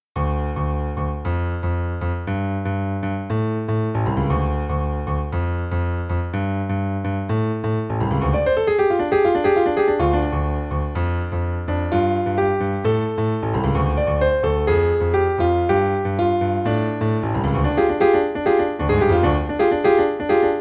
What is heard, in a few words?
Funk